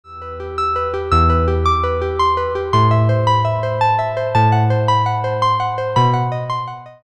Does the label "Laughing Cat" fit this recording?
No